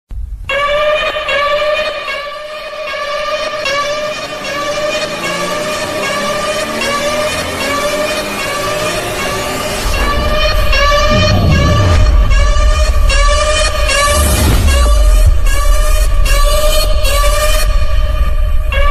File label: surya the soldier ringtone angry mood; allu arjun